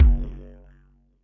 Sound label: BD digiro, Electric Kick, Yamaha DTXpress